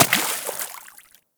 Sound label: Bullet Impact Water; Warframe; Weapons; Bullet Impacts